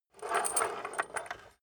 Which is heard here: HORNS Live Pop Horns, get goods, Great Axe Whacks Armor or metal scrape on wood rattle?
metal scrape on wood rattle